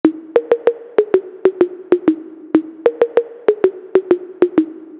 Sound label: low loop